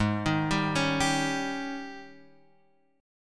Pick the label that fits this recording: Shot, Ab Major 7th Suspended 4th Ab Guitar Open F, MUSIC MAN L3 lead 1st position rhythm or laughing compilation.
Ab Major 7th Suspended 4th Ab Guitar Open F